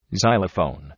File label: xylophone